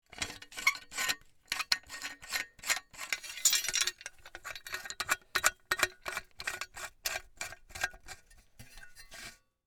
Folding Shovel, Sports, Folding, Shovel, Open, Army, Camping; Miscellaneous Sport; BlastWave